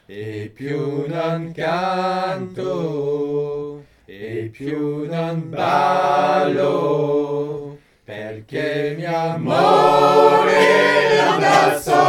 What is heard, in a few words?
ensembles, Chansons